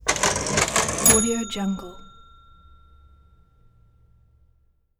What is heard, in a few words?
cash register